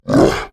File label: Screams Shouts monster processed; soundbits